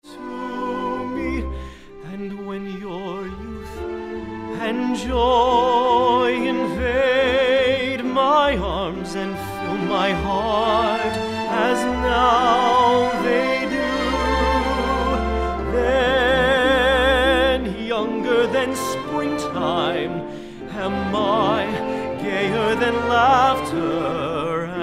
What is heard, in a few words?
with singer